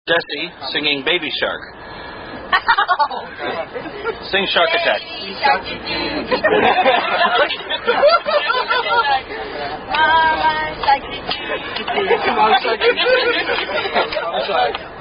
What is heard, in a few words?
Shark Attack Song